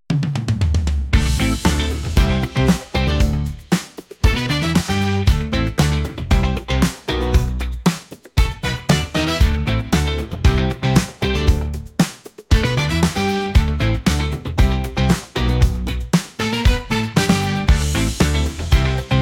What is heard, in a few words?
Groove